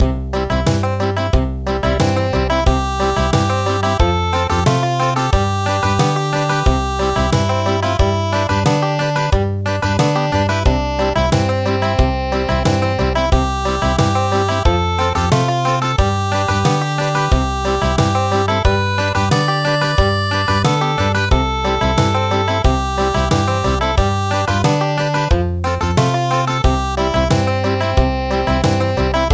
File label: National Anthem Country; traditional; Thai